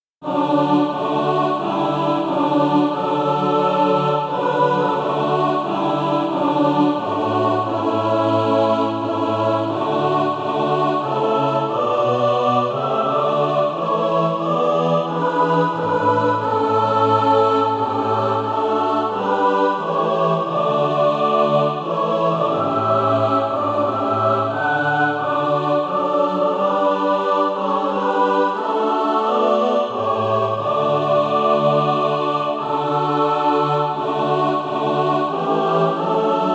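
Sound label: mixed, SATB Practise Music; Christ Returneth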